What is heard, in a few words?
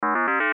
Sci Fi Beep Electric, Sci Fi Beep Electronic Tone Signal; Tech; Blastwave